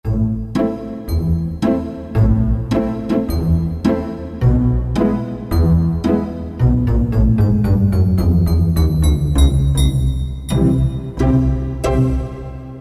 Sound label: Pizz Typewriter, Roland PN-D50-03